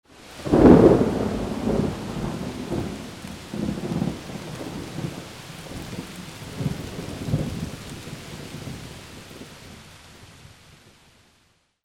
RAIN

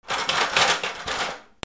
GARAGE

